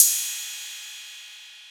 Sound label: Cymbal